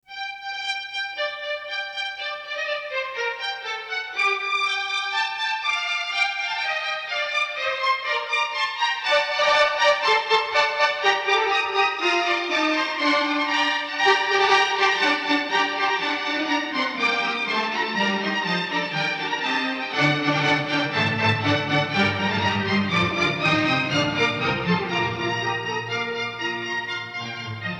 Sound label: Suite For Strings, Flutes & Horns; Barbirolli - Edition; Purcell